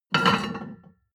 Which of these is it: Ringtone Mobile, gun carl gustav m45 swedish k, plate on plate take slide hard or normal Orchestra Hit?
plate on plate take slide hard